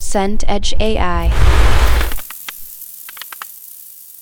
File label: earthquake rumble